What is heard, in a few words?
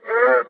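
voice unbalance; Boonga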